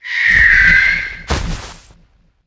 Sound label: cokatrice dead